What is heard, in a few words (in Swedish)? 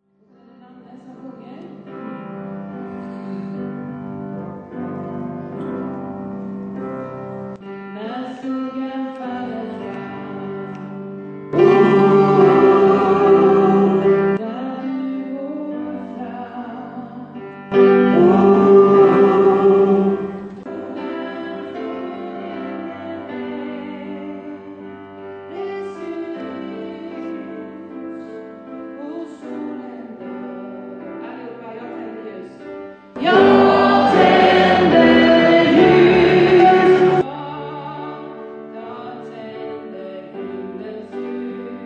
bas, stammor